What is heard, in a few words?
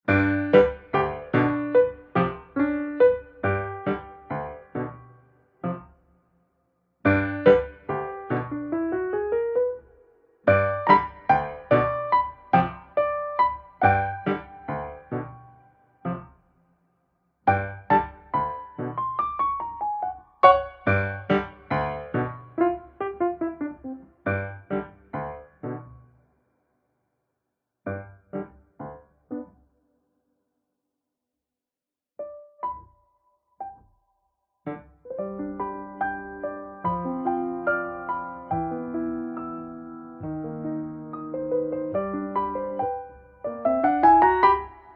Piano cover; ANIMAL CROSSING： New Leaf